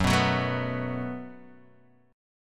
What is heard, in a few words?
Gb Minor Double Flat 5th Gb, Guitar Open D; 4,2,4,3,2,x; down; chord shape